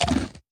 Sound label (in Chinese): 进食声; 嗅探兽